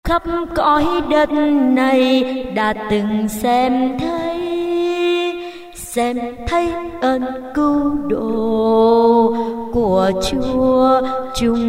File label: alto basso